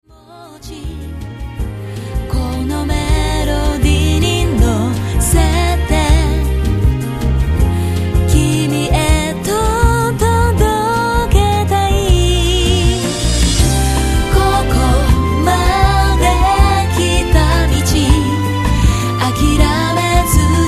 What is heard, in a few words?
bossa; blessing